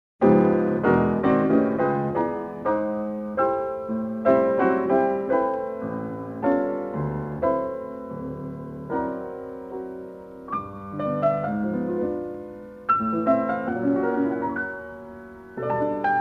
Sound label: THEME FOR PIANO